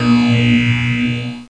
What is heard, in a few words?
fling block; Creative Review CD-ROM 28; kungfu; bald